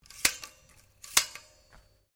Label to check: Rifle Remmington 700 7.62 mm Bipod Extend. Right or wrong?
Right